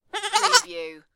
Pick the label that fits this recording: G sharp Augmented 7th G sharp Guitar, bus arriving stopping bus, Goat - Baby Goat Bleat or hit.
Goat - Baby Goat Bleat